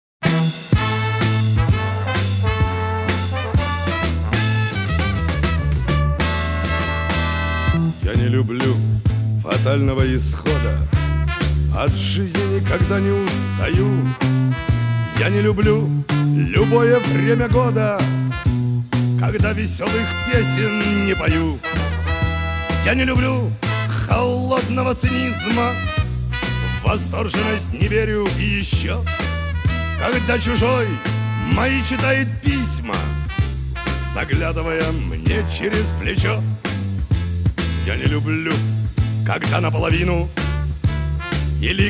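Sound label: instrumental ensemble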